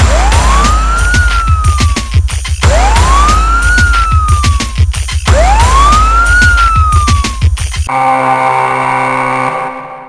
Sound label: trigger; quake2